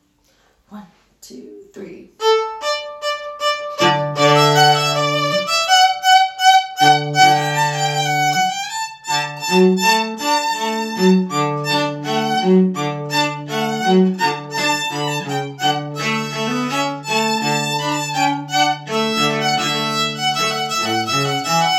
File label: Cello Violin; wedding; Ceremony & Cocktail/Dinner Music